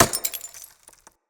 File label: Bullet Impact Glass Large; Weapons